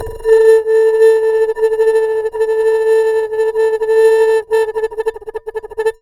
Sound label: Bowed, Large Wine Glass